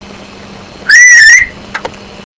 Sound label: whistle